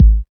smooth bottom end kickdrum g sharp key, Royality free kick one shot tuned to the G# note